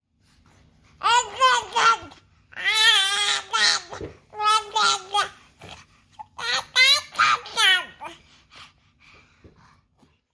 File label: baby talk ringtone